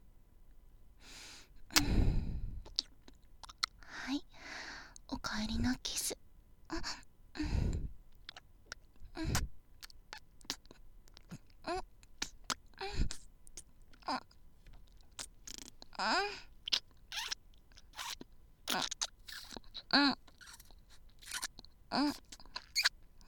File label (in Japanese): キス, ASMR